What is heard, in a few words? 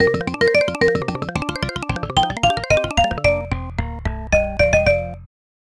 Opponent Knocked Out; Micro Machines 1994 SNES